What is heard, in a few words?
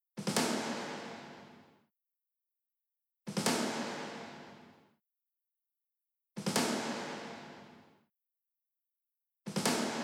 FutureBass Rhythm Loop Cut